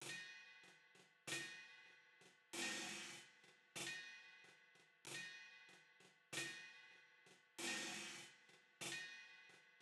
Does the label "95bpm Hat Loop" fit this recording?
Yes